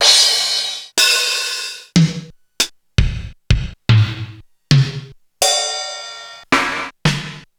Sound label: Korg DSS-1